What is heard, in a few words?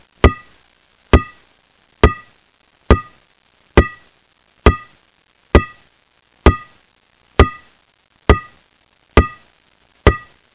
ball bouncing, sound effects; pinnacle; english